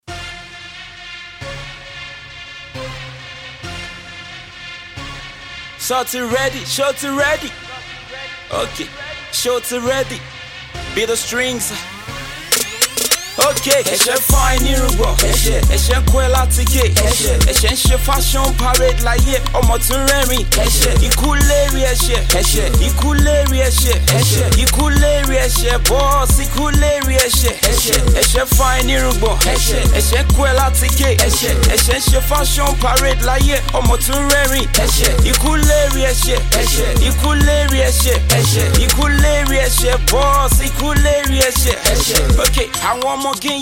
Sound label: MUSIC Strings